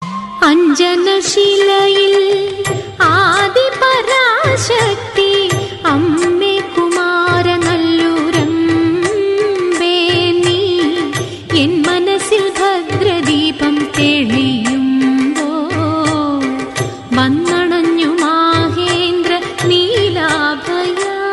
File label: malayalam ringtone